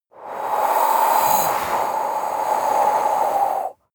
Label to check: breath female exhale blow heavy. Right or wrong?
Right